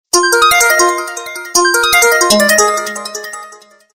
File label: Animato Tone; Samsung; GT-S5560 India